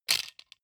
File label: plastic frame small hit rattle